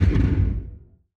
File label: distance shield hit, weapons; Stellaris